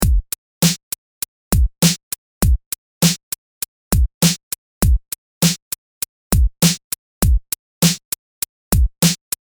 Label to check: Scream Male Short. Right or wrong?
Wrong